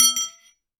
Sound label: Aluminium Tube Drop